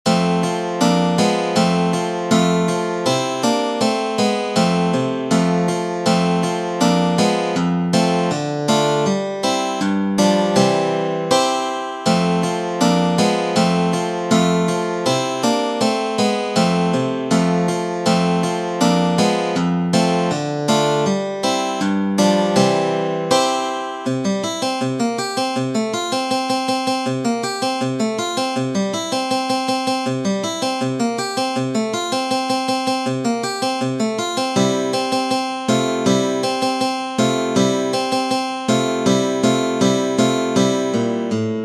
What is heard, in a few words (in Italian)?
capriccetti, guitar; 6 capriccetti